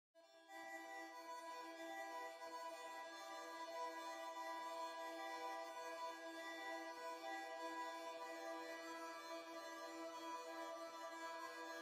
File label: synth